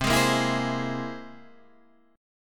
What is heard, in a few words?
C Augmented 7th, Guitar, Standard; strummed; 8,11,8,9,9,8; chord; JGuitar